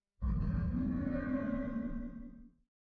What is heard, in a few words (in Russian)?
tochno sverhu oshelomlyayuschiy, zvuki meteorita asteroida